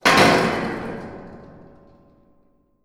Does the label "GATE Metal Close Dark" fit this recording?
Yes